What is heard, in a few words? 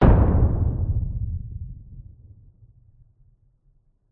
impact crash boom hollow rumble bass; Skyclad Sound